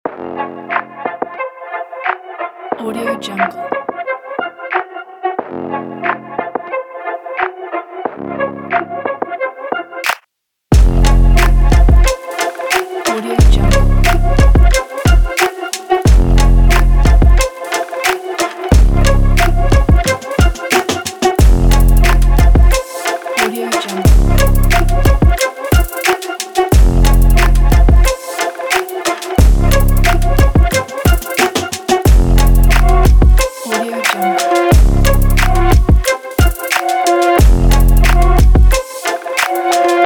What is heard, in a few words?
trap